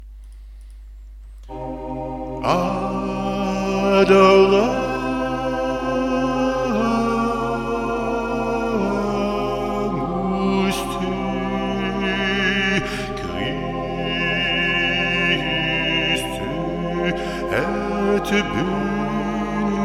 Adoramus te, choeurs; Gasparini

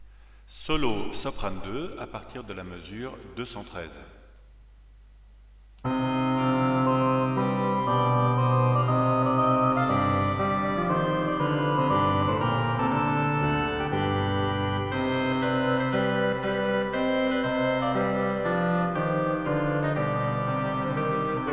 Credo solo; Charpentier

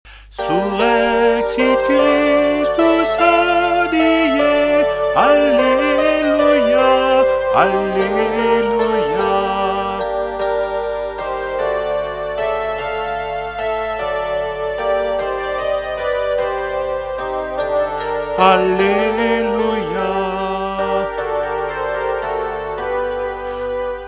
Surrexit solo

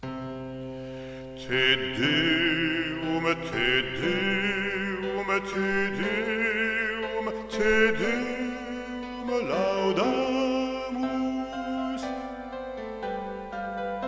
Te Deum; Charpentier

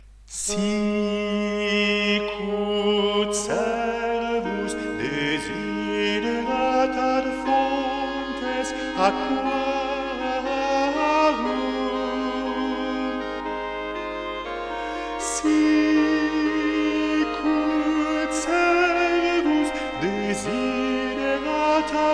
Sicut Cervus; Palestrina

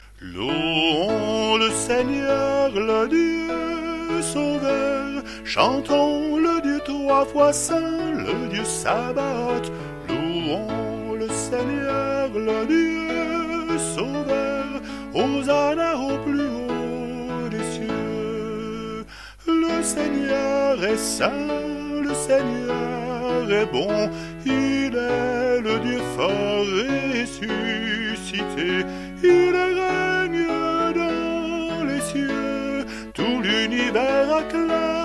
Te Deum; Charpentier

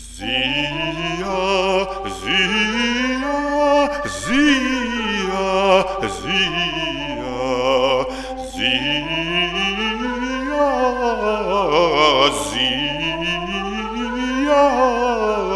Vocalise

